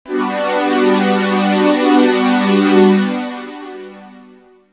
sample pads, jungle; Jungle Frenzy 1

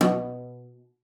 CELLO FINGER B2; Xsample VOL-5

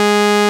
P6 SUB, P6 SAW, Vintage; INIS

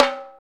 PER Percussion

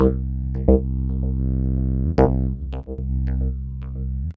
303 loops

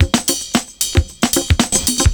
PERCS, 70 s Breakbeats

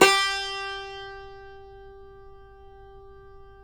CUMBUS, OUD; Roland - LA Composer vol. 3

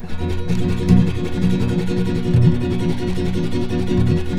C M, Guitars; Spectrasonics; Hans Zimmer Guitars VOL-2; FLAM TREM CH

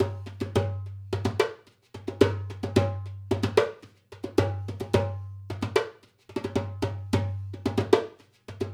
Dumbek; Essential Percussion

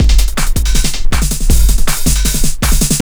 JUNGL 160, JUNGLE LOOP

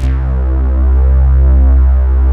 WARM PADS; Pro-Rec

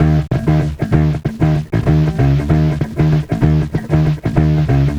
Bass

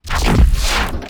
space distortion

